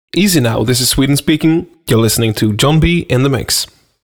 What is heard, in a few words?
shout